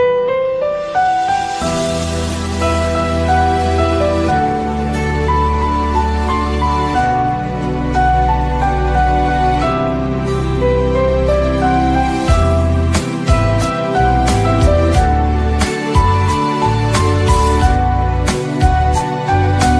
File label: flute ringtone, bollywood ringtones